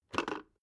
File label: water boiler open top